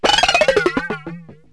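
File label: metal sounds; Wired for Sound Pro CD; Aristosoft, Inc.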